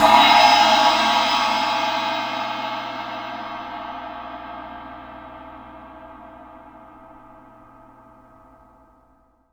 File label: Cymbal, Hip-Hop, Drum Kit